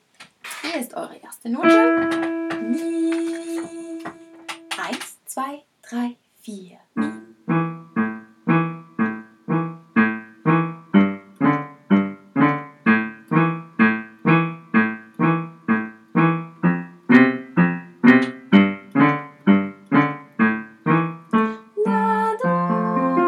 solo

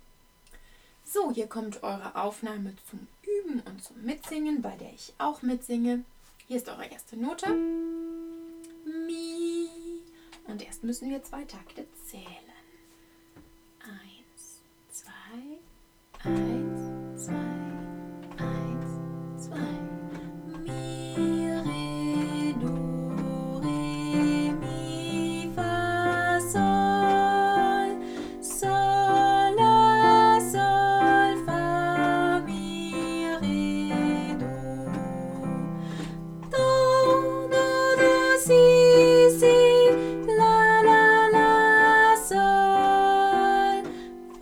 mit Melodie

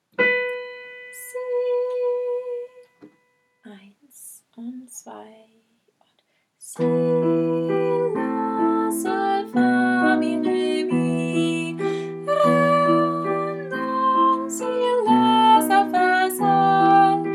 mit Gesang

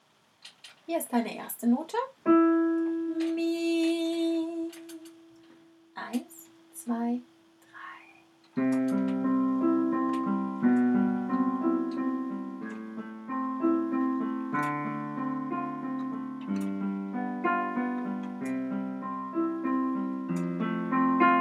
solo

